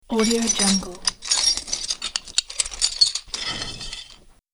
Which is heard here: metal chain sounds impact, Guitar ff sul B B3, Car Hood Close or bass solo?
metal chain sounds impact